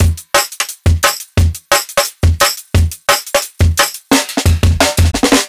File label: Live Jungle Drum Break, 175; JungleBreaks24